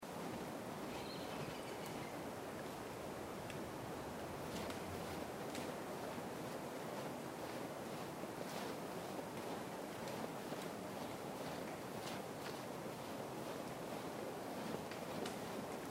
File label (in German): forest snow hintergrund; Life is Strange 2; gamesoundopedia